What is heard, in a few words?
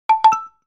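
original ringtones and notifications nokia 808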